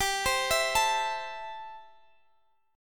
A Minor 7th G

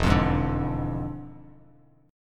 E 7th Flat 9th, Bass Standard, E7b9 chord; strummed

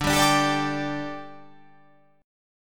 D Suspended 4th D, down

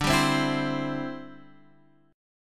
D 9th Suspended 4th D down; strummed; Standard; JGuitar

